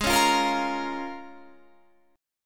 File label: C sharp 6th, G sharp, guitar chord